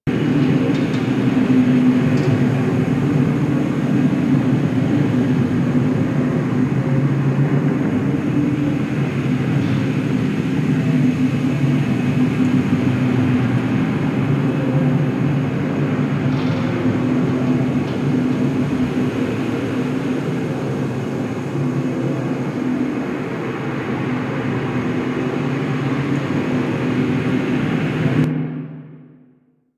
Ocean Interior Amb

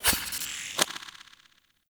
Lock Cancel; Warframe; Sacrifice quest; Minigame

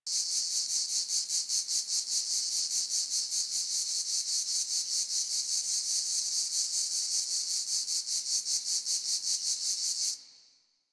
insects high loop; Ambience; Props; Loops; Warframe; Eidolon Plains

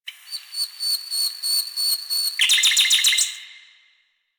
Valley Tree Critter; Ambience; Warframe; Quests; The New War

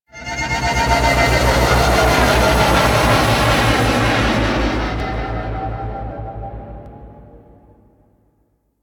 Liset Extraction Arrival; Nora

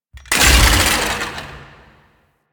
Necramech Melee Death Fall; Enemies; Warframe

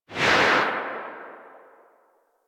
Arch Wing Atmosphere Flyby Right, Vehicles; Movement; Warframe

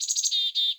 wetland forest day bird close; Ambience

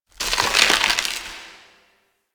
Infested Glass Crack; Warframe; Corpus Infested

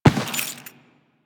Step Default, Footsteps; Warframe; Teshin

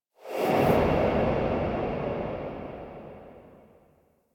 Amb Stop Whoosh; Warframe; Ambience; Lore

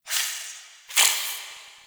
Interior Hiss; Ambience; Misc Indoor; DE; Warframe; Infested Eris

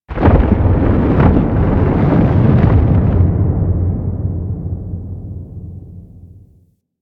Moon Earth Crack Large, Ambience; Warframe; Orokin

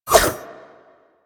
Kunai Whoosh

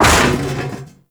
Mech Head Impact; Nechro

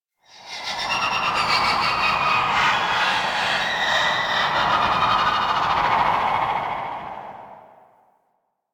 Void Connector Shriek One Off, Ambience; Warframe; Citrines Last Wish

